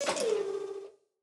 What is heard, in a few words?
robot hydraulic down; boss